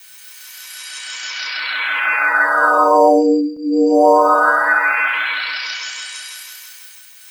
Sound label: SAUCERS; Spectrasonic Distorted Reality 1